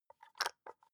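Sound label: soap dispenser press squish soft, bathroom; haus-garten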